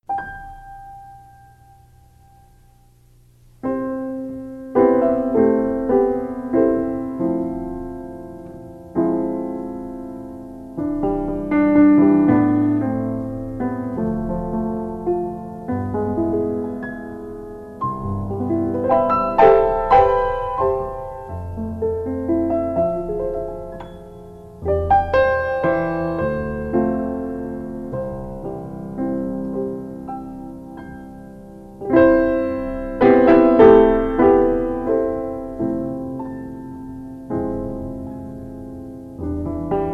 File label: Pulses, ballet music